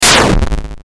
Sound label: explosion, sfxr